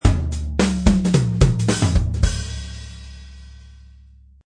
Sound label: Drum Fill, Funk Drum Filll at 110 bpm.; Funk Drum Beat Loop at 110 bpm; Drum Loops